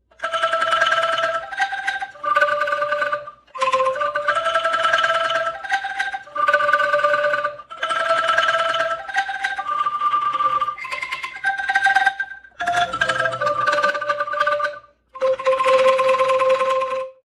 angklung

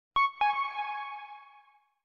huawei harmonyos

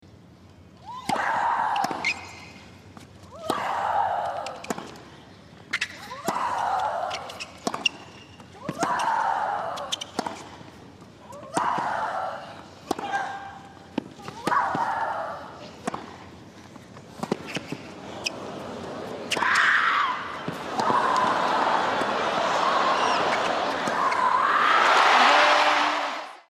tennis

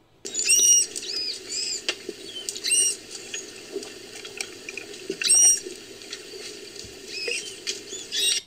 dugong

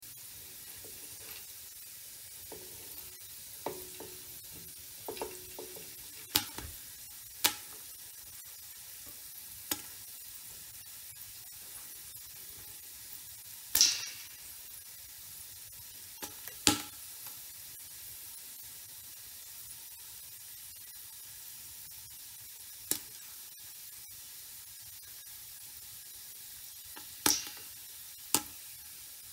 pop

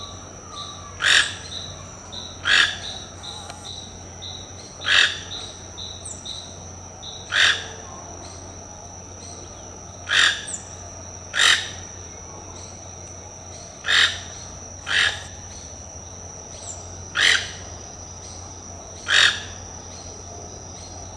tucan, zvuk tukana

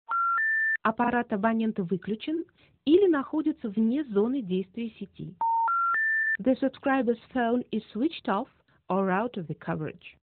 telephonn robot

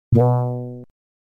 bong

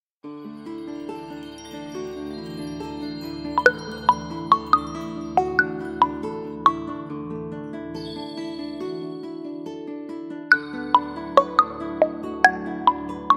breeze

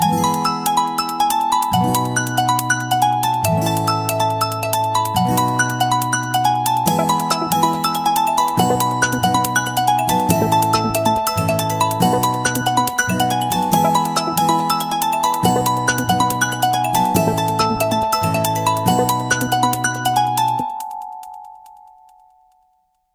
MIUI Ringtone Special Service; poco

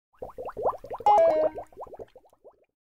water warning

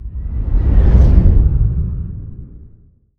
power wooshes

